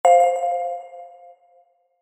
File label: Interface Push Button; Casual SoundFX Pack